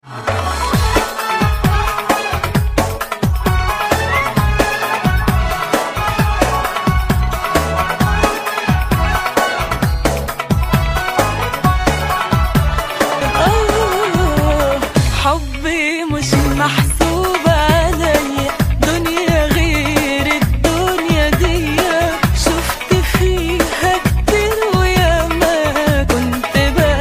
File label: arabic music